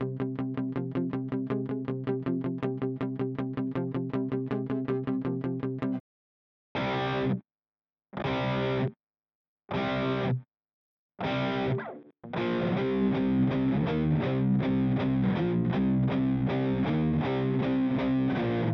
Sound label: Guitar Dry Reference; Seventh Heaven Professional